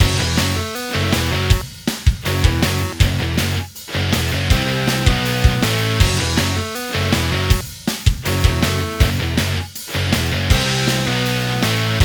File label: 3d Music